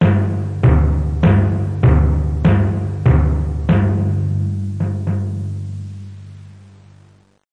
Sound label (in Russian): litavri melodiya monotonnaya